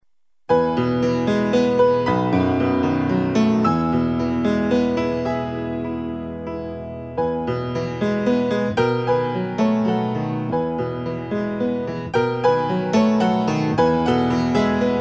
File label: adagio